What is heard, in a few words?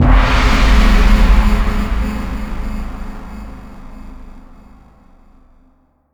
deadringer gong strike; Crypt of the NecroDancer